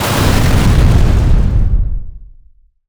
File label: boom